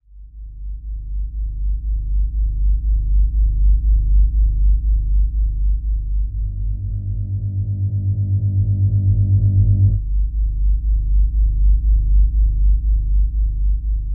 Sci Fi - Starship Sub Ambience

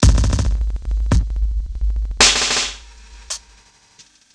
Loop, 110 bpm, Stutter Sounds; 99Sounds; Mangling Audio

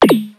8-Bit SFX & UI - Button Open Menu; The Chris Alan; 8-Bit Complete SFX & UI

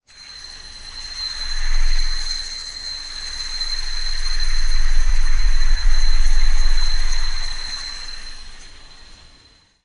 Hand Spinner; Fox Audio Post-Production

